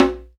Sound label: Djambe, Akai MPC2500